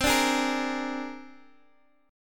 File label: C 7th Suspended 2nd Sharp 5th, Guitar, Open G